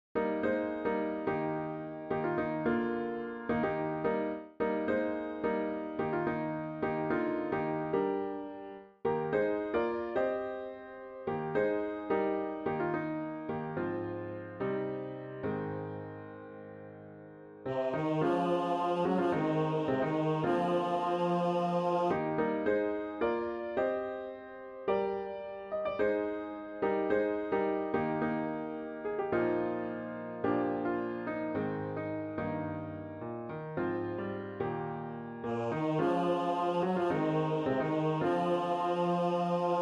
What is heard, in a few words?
large choir low voice Eb; Fellowships – Mass Choir, low voice practice file